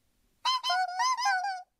キュキュッと拭く音

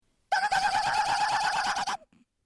マシンガン

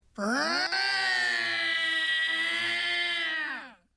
チェーンソー

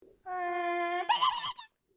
ウグイスのさえずり; 鶯的鳴叫; 声マネキング; 声マネキング対決; フブみこさん; ホロライブ; さくらみこ

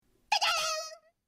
拍子木; 拍板; 声マネキング; 声マネキング対決; フブみこさん; ホロライブ; さくらみこ

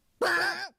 ファスナーの音

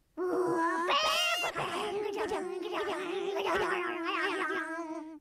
電車が通過; 電車通過; 声マネキング; 声マネキング対決; フブみこさん; ホロライブ; さくらみこ

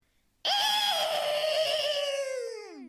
馬の鳴き声

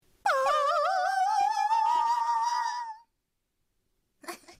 ハーフの音色

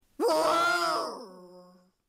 ライオンの鳴き声

